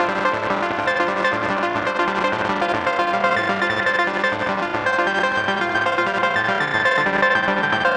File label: eurorack